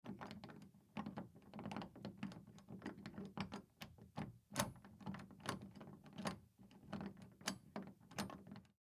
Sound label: Lock Pick Door, Lock Pick Door Key; Police Cop Burglar; Emergency